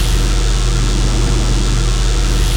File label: mohole beam loop